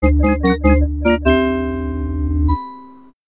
Star Catch Fanfare; B3313; n64

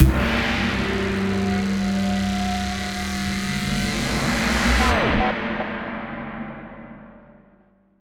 Music Stings

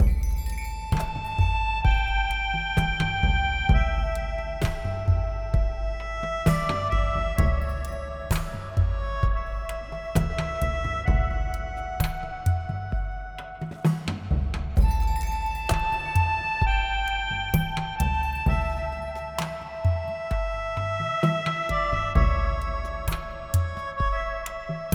Drum; Unravel gamerip 2016

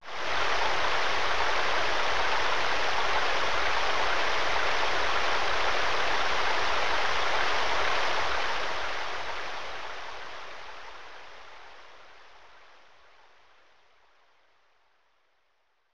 Rain; Sound Novel Tsukuru; SNES

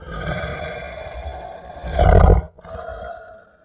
Ambient Camel

